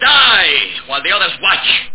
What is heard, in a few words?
fire; Worms DC